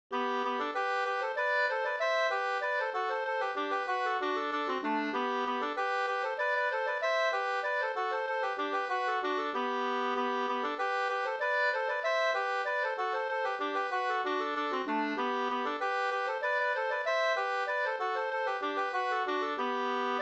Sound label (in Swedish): engelska f tre par